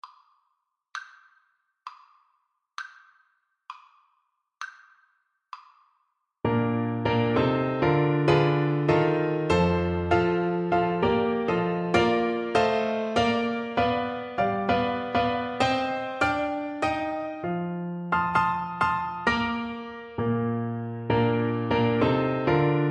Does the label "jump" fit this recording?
No